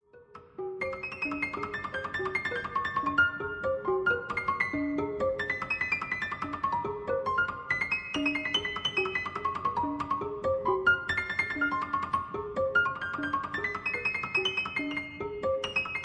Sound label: prepared piano